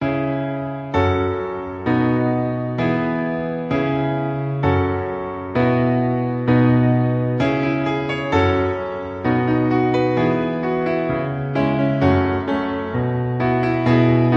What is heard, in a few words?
Piano Cover